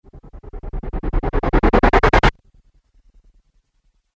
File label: Riser Abrupt Stop Chorus; Rise And Dive